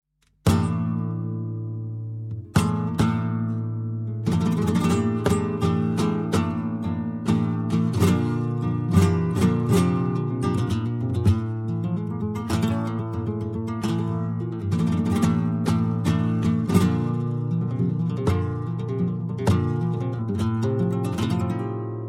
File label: tarantos, flamenco